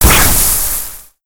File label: impact, weapons; ppistol